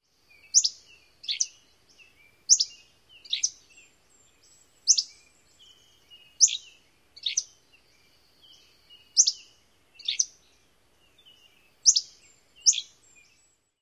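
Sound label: song, bird; WA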